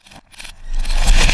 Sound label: REV, SOUND EFFECT REVERSE; AKAI S6000 CD-ROM - Volume 1